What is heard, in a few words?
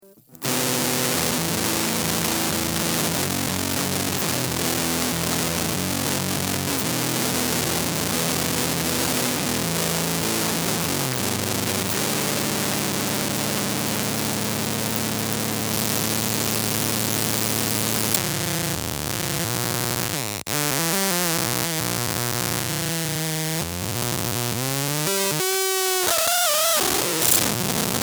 noise jade